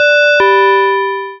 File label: Door; WMATA